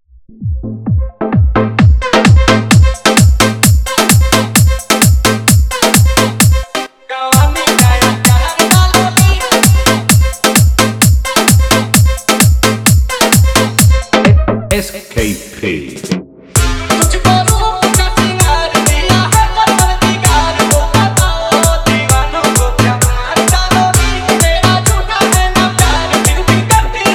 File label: Bhojpuri Dance Remix Song